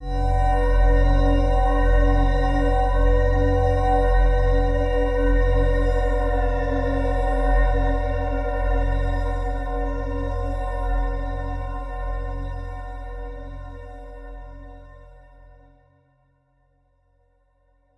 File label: Horror Texture Obsession